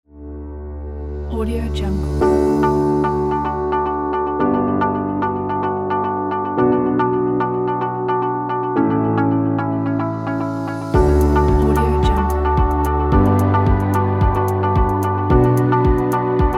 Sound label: corporate